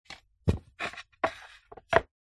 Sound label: foley wood pieces small movement gentle; zapsplat